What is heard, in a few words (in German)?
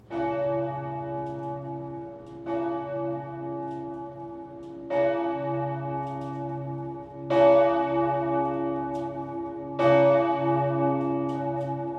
Glocken, gelaeut; Maria Laach